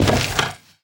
fs fm plywood, footsteps; player; Portal 2

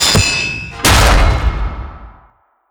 explo; a4 strider; swipe

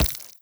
step layer, headcrab black movement

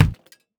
fist hit; physics

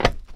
metal solid, footsteps

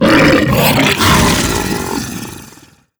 death, creatures, barnacle; Half Life Alyx

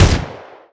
pistol fire lfe; weapons; Half Life Alyx

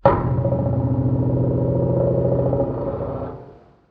nuclear pump; nuke

